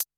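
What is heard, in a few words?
Closed Hat, Electro Kit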